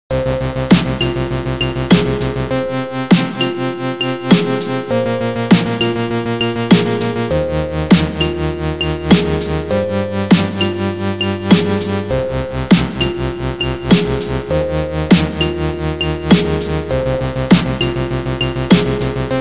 Music Of Rain

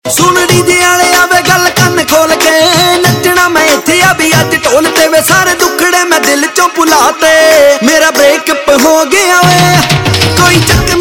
Breakup Beat; Punjabi

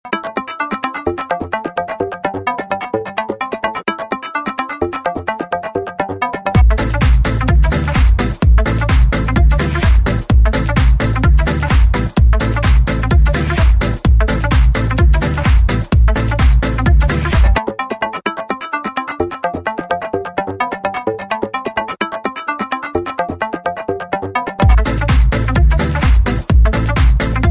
Loop Bass Mix

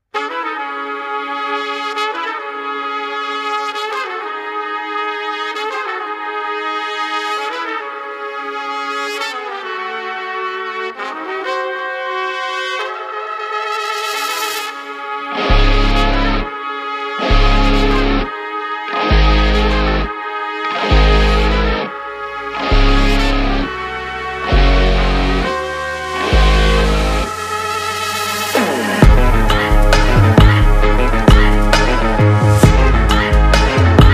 Theme - Instrumental; Petta Theme

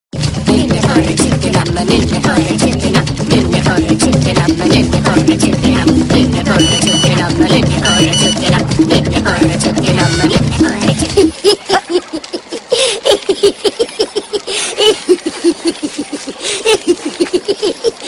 Zoo Zoo Jungle Laugh; Vodafone

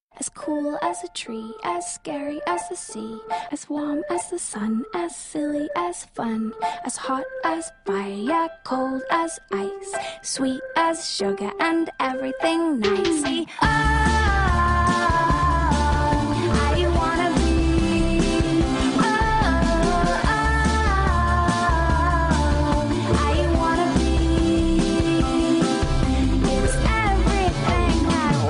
windows 8 song

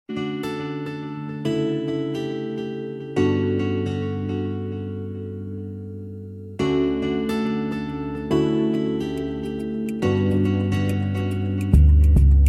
romantic tone

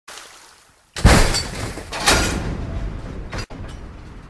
Machine; 3D Tones

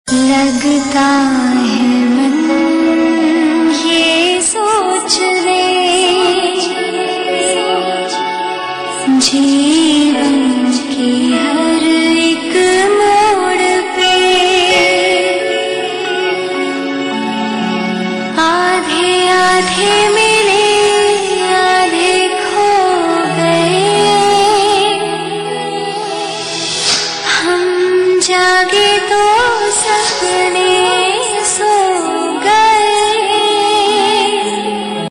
Sad Tune; Zee TV; Chhoti Bahu; Choti Bahu

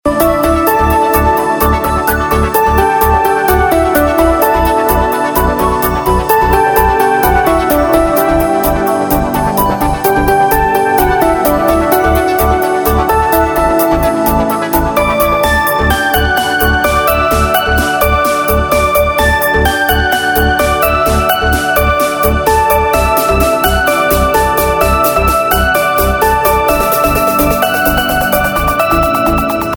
Instrumental Ringtone; Bollywood Hits (2015)

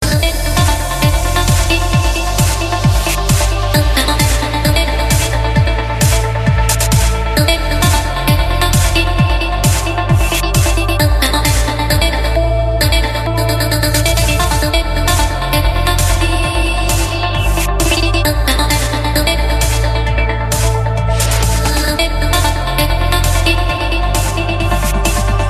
Trance Beep; Ringtones

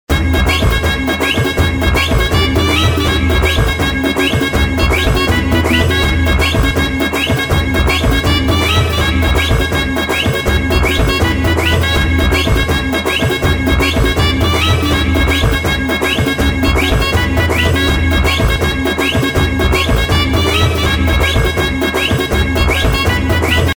Instrumental

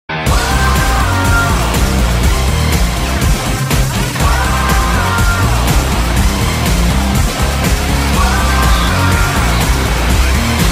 theme; WWE Superstar Ringtones; cody rhodes

